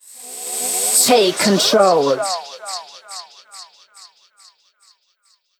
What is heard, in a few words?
Vocals; Vengeance Samples; Special Sounds